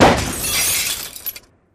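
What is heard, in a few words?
Metal Impact Pop Glass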